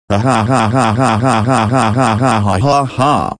laughing

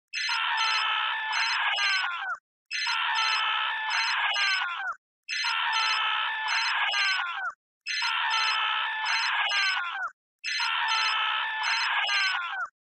ringtone; blitzo

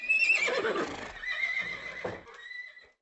stable; aoe2

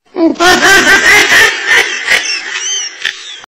squirrel laugh

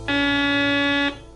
metal sound

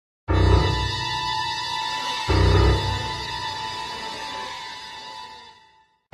robber music; sims 1

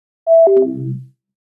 turned off; oculus

